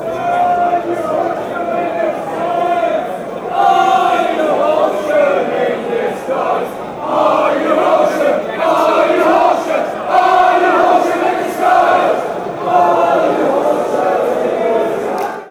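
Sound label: fanchants, football chants; disguise crawley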